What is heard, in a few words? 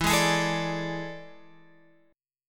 E 11th; E11 Chord {12 11 X 13 10 10} strummed